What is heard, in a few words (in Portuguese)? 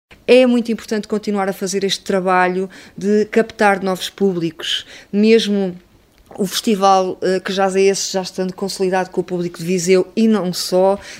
festival jazz